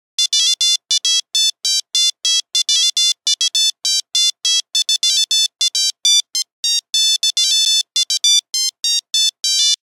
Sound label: 8310 Canter, Nokia Buzzer; Windows Phone apps